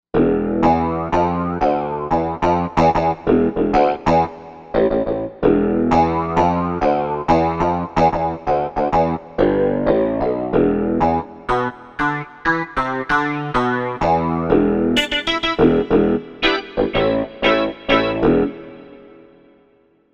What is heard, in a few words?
Resonant, Korg M1Rex; Factory Patches